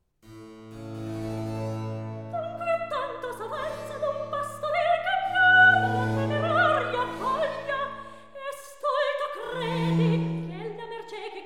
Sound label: Recitativo (soprano); Il duello amoroso HWV 82; Amarilli; Dunque tanto s'avanza; Handel Masterworks; Klassika